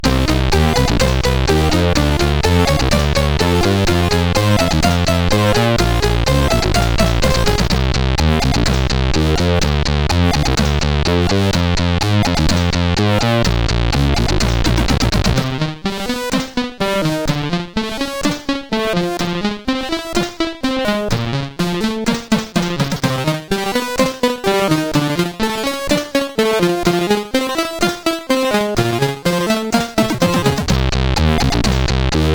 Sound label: Test, CSG8580R5